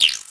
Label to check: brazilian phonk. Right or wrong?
Wrong